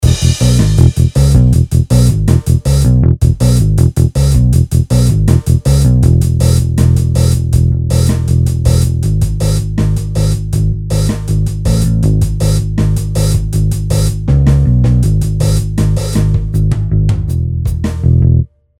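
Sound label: Drum rhythm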